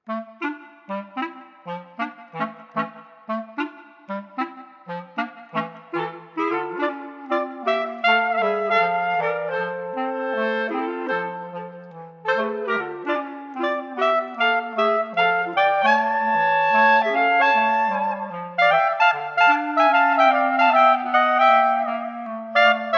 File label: Clarinet Trio